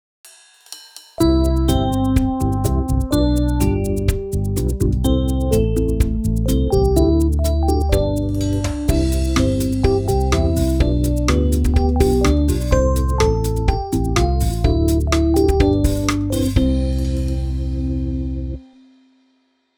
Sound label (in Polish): Muzyka zakonczenie zajec